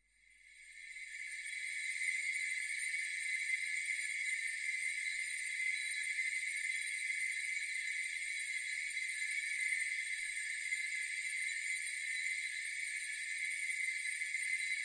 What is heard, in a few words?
Museum with sirens